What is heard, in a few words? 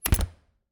Refridgerator, old fashioned, wooden, steel, brass clasps, hinges, close, squeek, piercing, wide; Eiravaein Works; Vaeyan IV